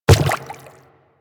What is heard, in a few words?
Quadruped Medium Walk Water, Footsteps